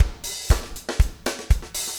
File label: 4 on floor 120, loops, 120bpm